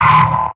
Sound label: pain sharp; pyro; video games; source engine; tf; mvm